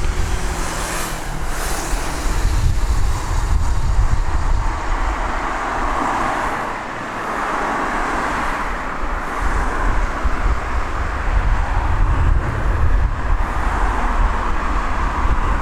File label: road, Olympus LS-10; Location: Main Road (1m05s); WAV File - 16 bit Stereo 44kHz; Olympus LS-10 and LS-11 Recorders Reviewed